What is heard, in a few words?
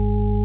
g sharp 2, music keyboard